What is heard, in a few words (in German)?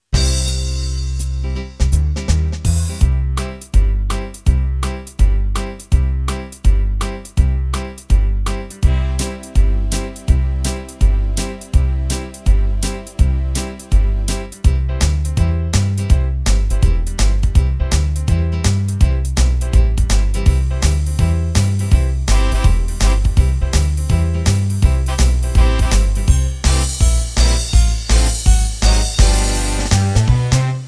Style Demo Foxtrott, Intro 1, Var 1-4, Ending 4; VSP-3; VIVA MUSICA Fachhandel